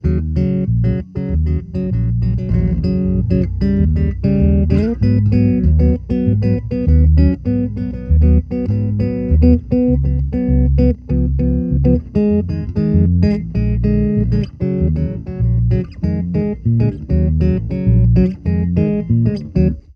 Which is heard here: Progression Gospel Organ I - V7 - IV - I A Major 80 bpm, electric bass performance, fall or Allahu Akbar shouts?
electric bass performance